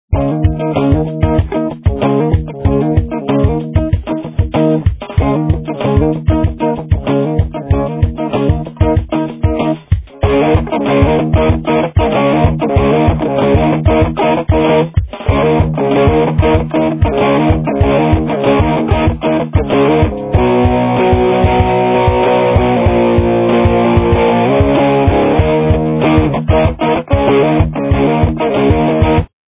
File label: pedal